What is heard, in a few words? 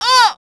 hit; BattleSNDS